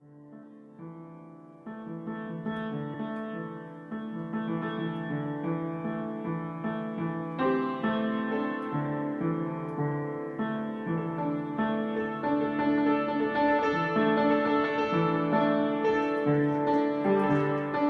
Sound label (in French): piano extrait pour ballet